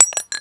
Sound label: ammo casings